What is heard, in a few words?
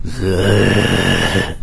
zombie alert